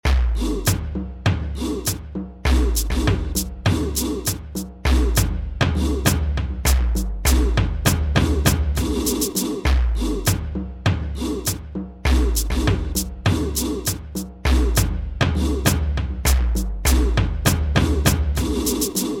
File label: Awkwaard loop